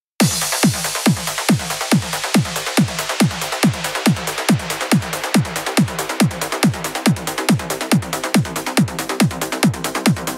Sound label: uplifting trance